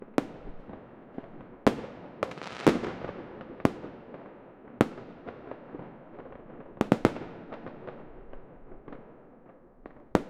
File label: a series of fireworks lau